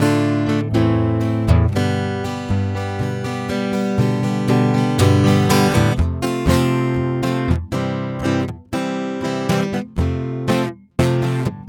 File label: vocals on acoustic guitar; vocal plugin on guitar; cla; waves-audio